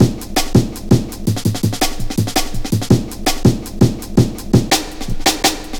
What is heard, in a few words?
Pride, Drum Loops, 165.5, Jungle